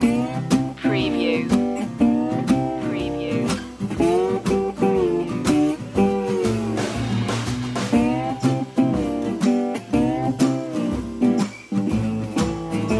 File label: star spangled blues loop